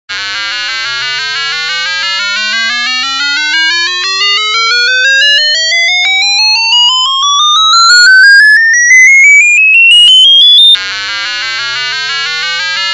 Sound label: musicbox